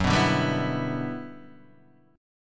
G Major 13th

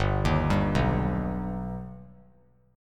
G Augmented G, chord; G+ arpeggiated; JGuitar

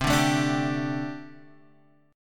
D Suspended 4th C, guitar; Dsus/C; strummed; JGuitar

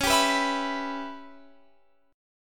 C sharp Minor 7th Flat 5th C sharp Guitar Drop D

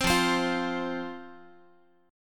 F 5th F Guitar; F5 strummed

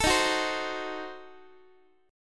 D sharp Diminished 7th D sharp Guitar; strummed; D#dim7; x,x,12,13,10,11; JGuitar

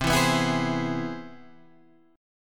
C Augmented Major 7th, Guitar, Open G

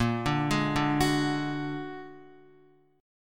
Bb Augmented 7th Bb down Guitar 4F,4A,5C,5G,5C,6E; Bb+7; arpeggiated; JGuitar

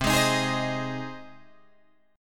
C Minor Sharp 5th C down Guitar Open G

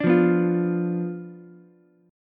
B Diminished B down Ukulele; Bdim strummed

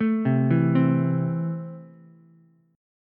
E Suspended 4th Sharp 5th E down Ukulele; Esus4#5 arpeggiated

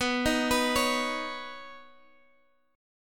B Major Add 9th, Guitar Standard chord; arpeggiated; x,14,13,16,14,x; JGuitar

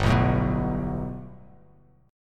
G Major Add 9th, Bass Standard; Gadd9 strummed; JGuitar

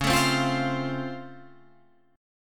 Db Augmented Major 7th, down, Guitar, Drop D

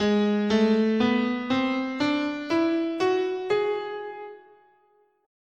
Altered, scale, guitar

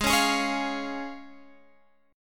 Db Major Ab

